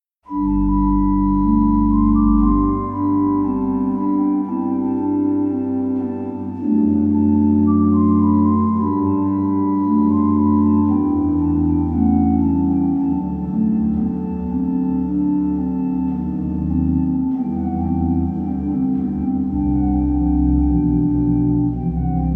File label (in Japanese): オルガン版試聴; 「最愛のイエスよ、我らここに集いて」（バッハ作曲）